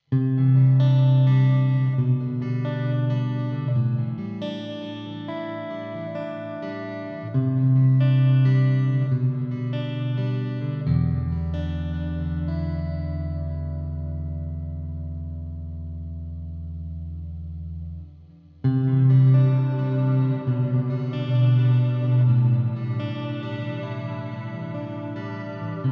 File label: Reverb Focus; LOOMER